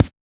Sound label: run carpet